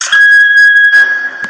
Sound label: door; Evangelion